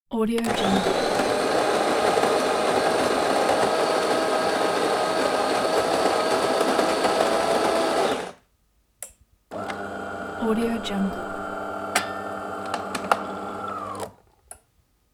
coffee machine

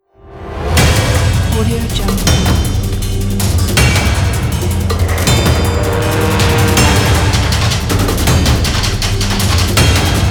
action sequence

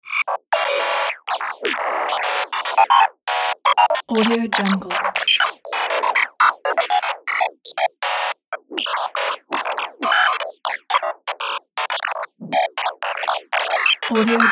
malware infection

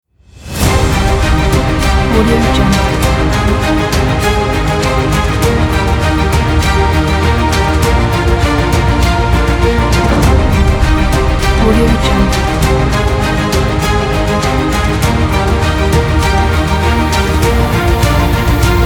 the epic logo

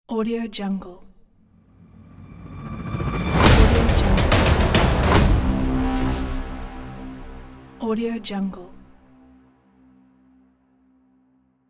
teaser epic logo

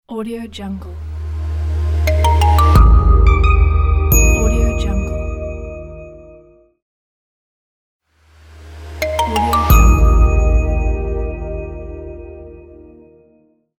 modern pluck logo

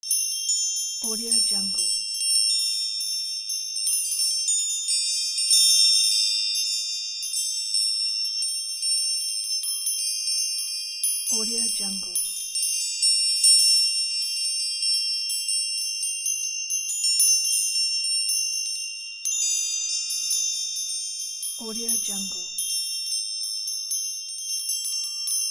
magic chime

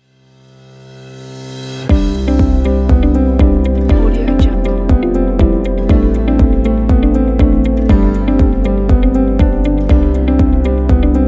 inspiring corporate

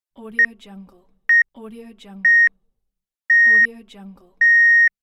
censor beep

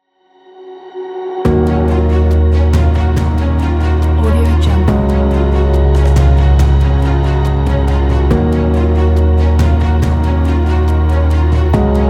the epic

